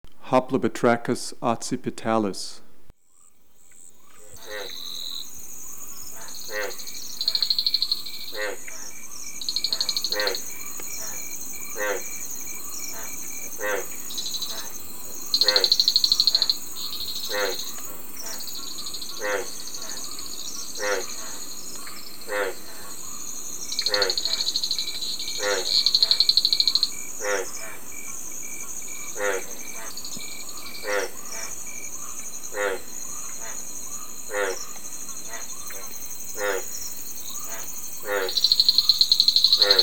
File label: Hoplobatrachus occipitalis